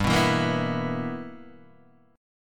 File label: B Major 7th Suspended 4th Sharp 5th G